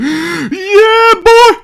zombi idle; garrysmod

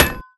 impact nade; garrysmod

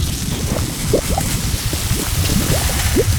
poison loop; garrysmod

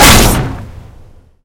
spear shatter; garrysmod

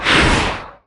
rev ended; garrysmod

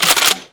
pump; garrysmod

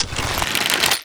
bow draw; garrysmod

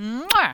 kiss; garrysmod

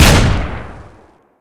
mk shot; garrysmod

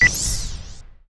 scan beep; garrysmod

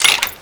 bow reload; garrysmod

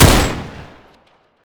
vhs; garrysmod

